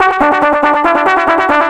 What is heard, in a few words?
Trombone Effect, effected trombone